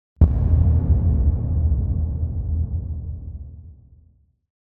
Impact Drum Dull Low Wooden Reverberant Hit; Hollywood Edge; sound designer's toolkit v2

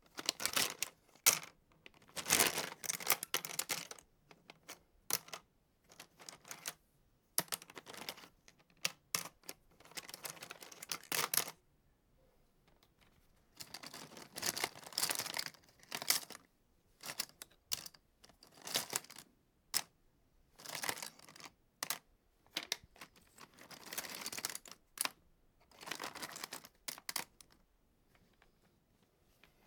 laundry clips - pickup, shuffle, put back; NS Studios - ultra sound collection

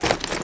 Pickup Armour Flak Vest; Pickups

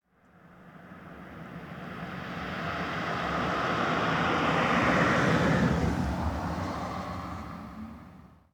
Auto Sedan Passby; Automobiles

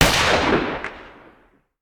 Hand Gun; Sound Ideas; Guns

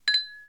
Glass Clink Single; Home & Office; 1001 Sound Effects

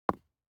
DICE on Neoprene, Drop, Standard, 1 One Die; Samples; ArticulatedSounds; DICE Sfx Party (Extended)

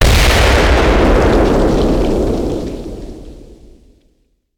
Explosion; Sound Ideas; Guns

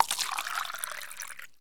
Pour Juice Paper Cup; SFX Kit; Foley - Misc

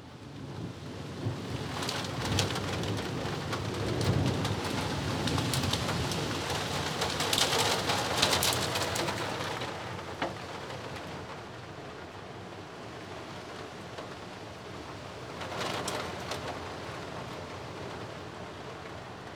hail storm; best service Blue Box; rain thunder

